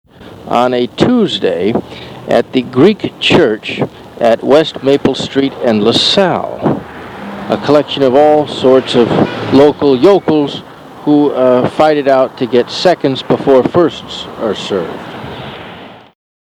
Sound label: Greek Church